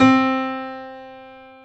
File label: PNO, Piano; AKAI S6000 CD-ROM - Volume 1; CS PIANO